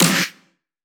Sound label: Snare; Vengeance Samples